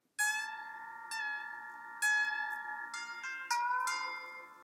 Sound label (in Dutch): Muziekfragment Oldies